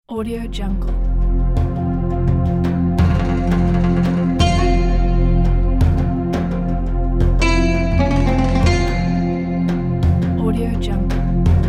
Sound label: oud